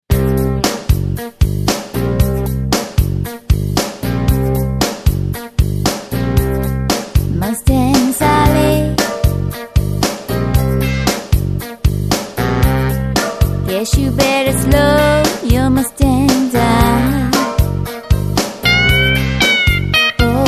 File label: Steel Strings